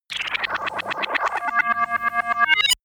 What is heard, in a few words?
alien communication signal